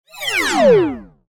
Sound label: Descend Digital; Descends; Sound Design; Multimedia; Blastwave